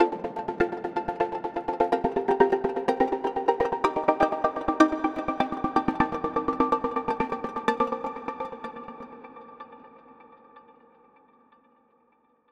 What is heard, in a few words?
Chords Full Pop; midi wizard